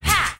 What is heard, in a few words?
ha, Voice ooh ahh